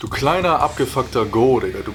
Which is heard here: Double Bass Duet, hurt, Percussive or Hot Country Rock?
hurt